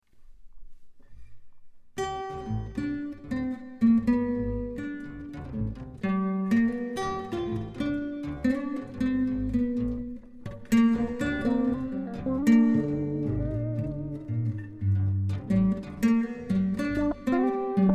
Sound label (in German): Gitarre gedoppelt